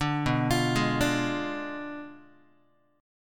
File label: B Diminished 7th B down Guitar; Bdim7 arpeggiated; 5,7,8,6,6,x